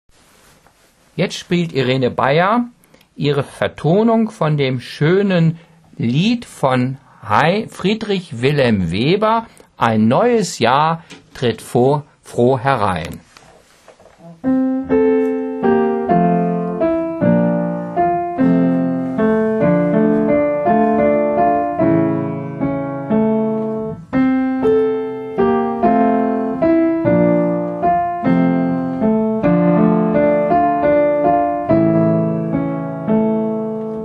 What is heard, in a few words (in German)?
Klavier